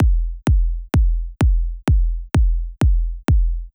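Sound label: Drum Loop 128BPM bass drum; VEC5 Drumloops; VEC5 Multi Loops; Loop Elements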